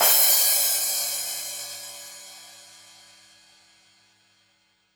Crash, Cymbals

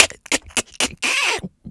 Human Beat Box, 140; Loopmasters

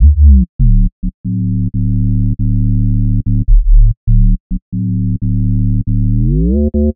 Bpm138 Bass Loop D; Heaven; Prime Loops; Dubstep Illusions

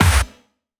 Snare, Dubstep One-Shot Drums

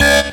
Synth Shot C#; Mutekki Media; Vengeance Electroshock Vol.2

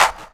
Claps & Snares

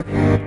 G STAB, funky

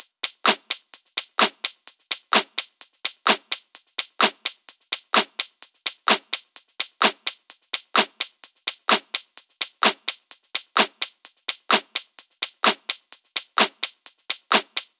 Techhouse Drums; Mutekki Media; Vengeance Electroshock Vol.2

